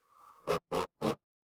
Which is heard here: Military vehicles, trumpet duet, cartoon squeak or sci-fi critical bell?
cartoon squeak